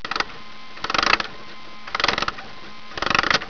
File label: winding sound